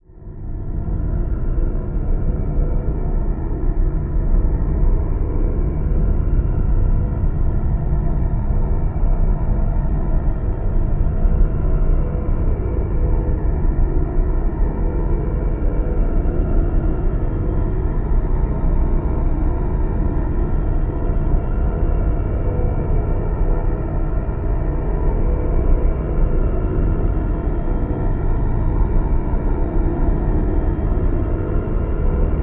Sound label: Drone Dark Phase Evil Gritty; Production Element Imaging; AdobeCC